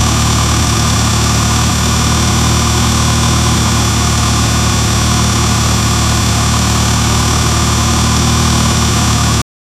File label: engine